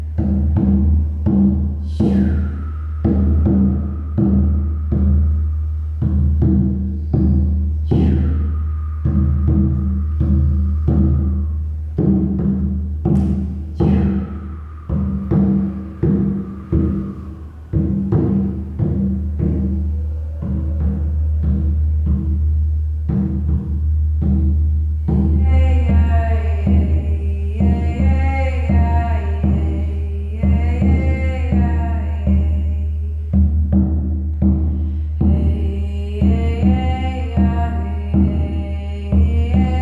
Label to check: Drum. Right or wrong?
Right